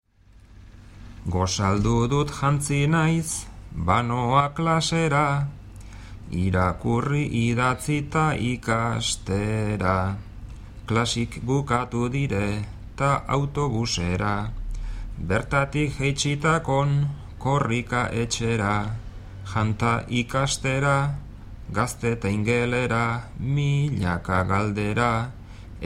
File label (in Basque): bertsos